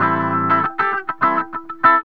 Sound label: GTR; Zero-G; Funky Elements